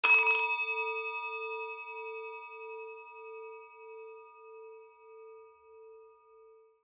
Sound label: japan rin bowl crash